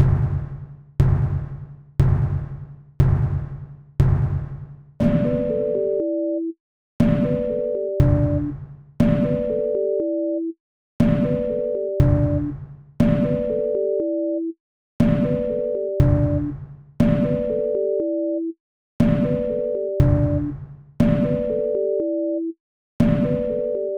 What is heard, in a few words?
sine wave